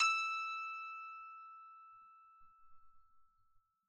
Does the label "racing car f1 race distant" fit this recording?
No